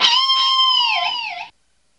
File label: GUITAR, POWER GT